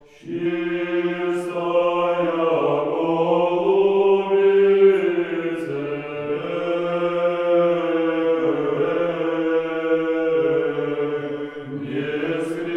Сретение. 8 припев 9 песни. Чистая Голубице. Казань

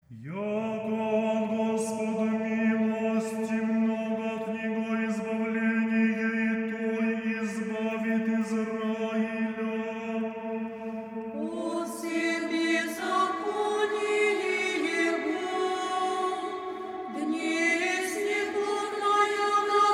Рожество Богородицы. Днесь неплодная врата отверзаются. 6 глас. На Господи возвах.

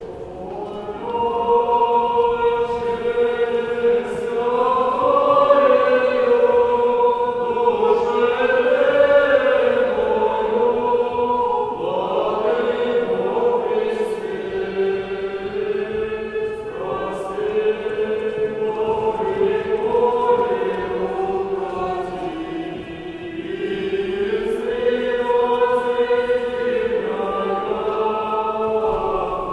Рогожский хор 5 глас ирмос 6 песни

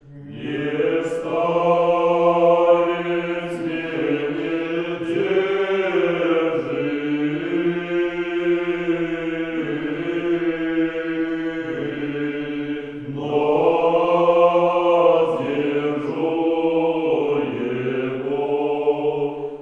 Сретение. 3 припев 9 песни; Не старец Мене держит; Казань

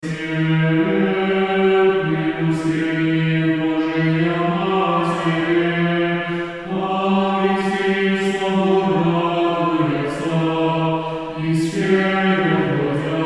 Успение малая вечерня; Господи возвах подобен Небесным чином

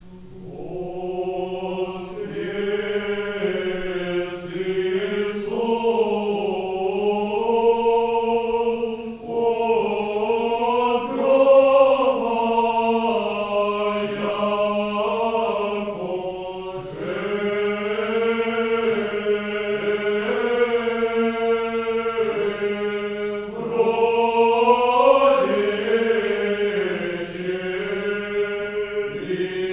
Концерт в Благовещенском Соборе. Пасха; Казань; Воскрес Исус

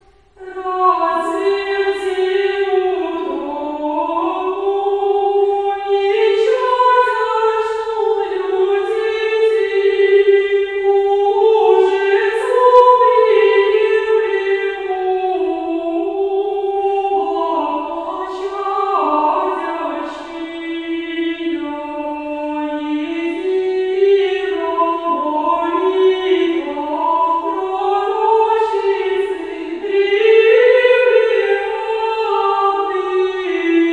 Пятидесятница ирмос 1 песни

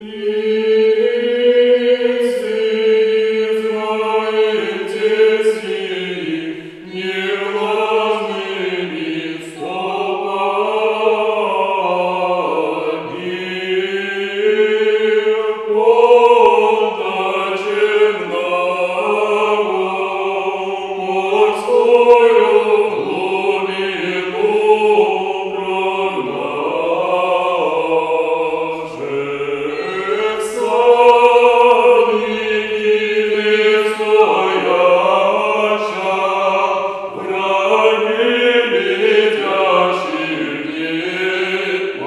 Преображение. Ирмос 1 песни; Лицы израильстиии

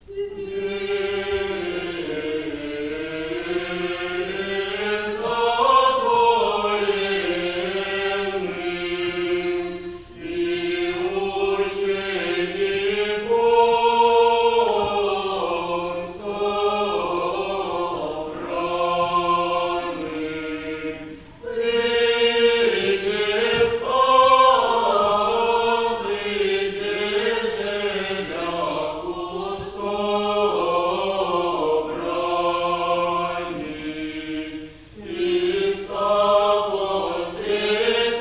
Дверем Затворенным. Литейная стихера на Фомину. Глас 8

